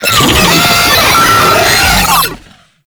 Proto Infested Brachiolyst Attack, Vocals, Enemies; Warframe